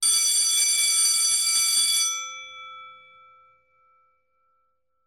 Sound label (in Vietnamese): tiếng chuông reo hết giờ